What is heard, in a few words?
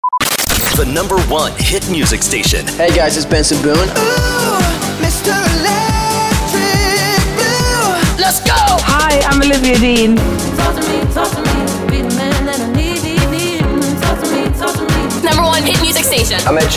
sweeper beatmix